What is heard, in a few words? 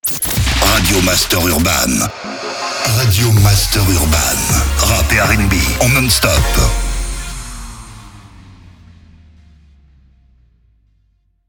JINGLE RADIO; RADIO MASTER URBAN; Habillage